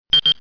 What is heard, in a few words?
beep